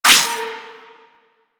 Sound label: Shadow Melee Swipe; Warframe; Duviri